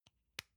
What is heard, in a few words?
lotion lid close soft; friseur; berufe